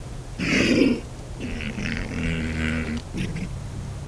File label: Gazella subgutturosa rutting calls